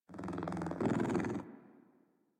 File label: Kitten Growly Purr; Enemies; Warframe; Catbrow